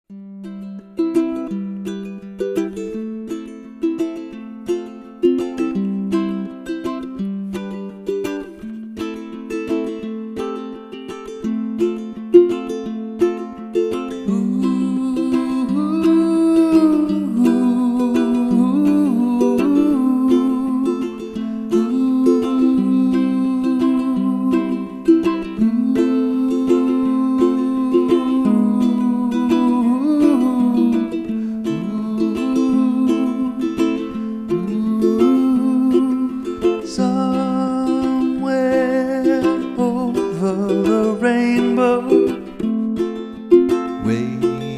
featuring Ukulele; Entertainer

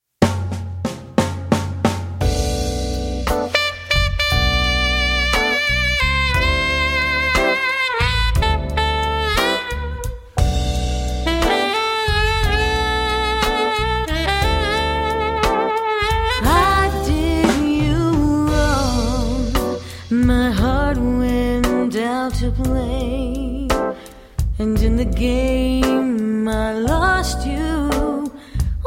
jazz band

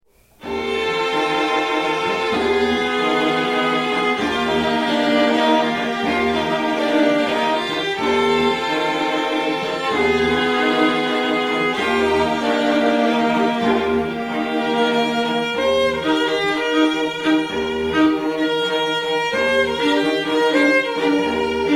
string quartet; Monty Bloom Ensembles

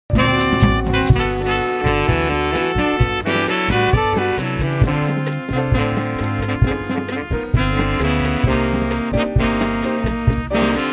Combo, Swing Band

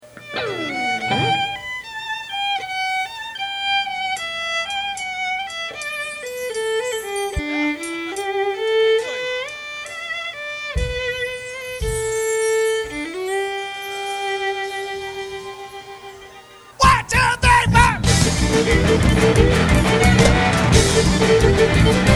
live, irish band

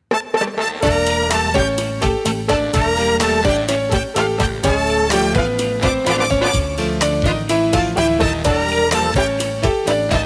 swing band; Orchestra

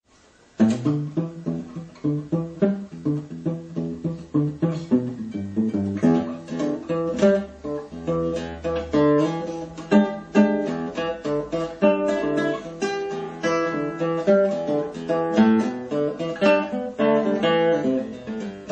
Guitar

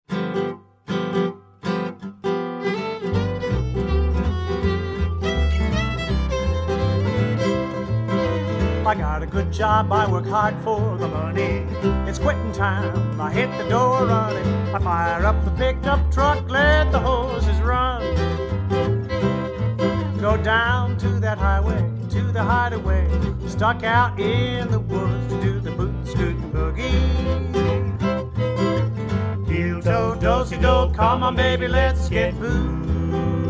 COUNTRY BAND

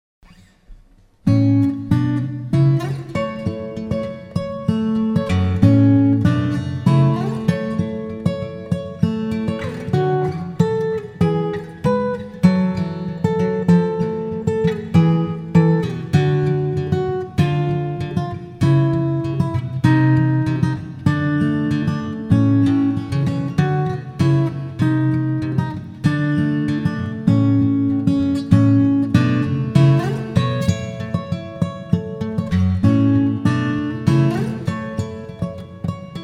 Cover Solo Acoustic Guitar Instrumental

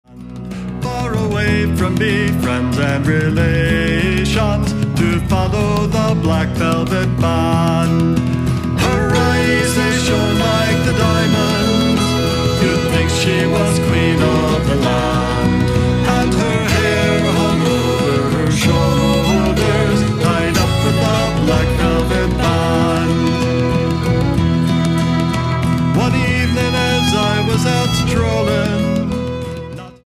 Irish Band; Black Velvet Band

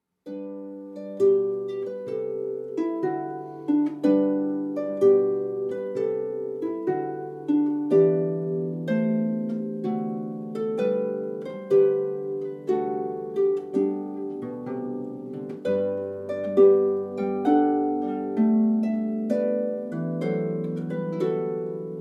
Air, Harp; from Water Music; G.F. Handel

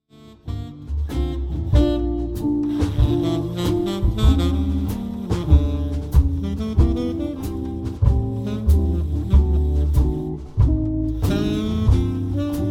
Jazz Standard Vocals Sax Guitar Drums

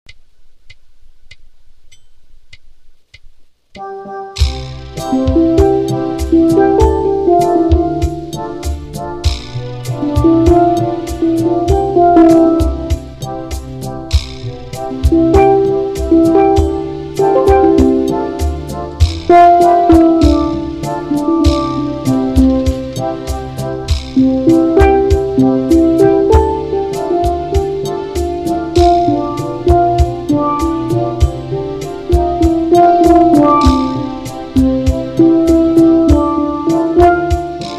Folk, Steel Drum

